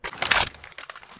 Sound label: clip out, weapons; cstrike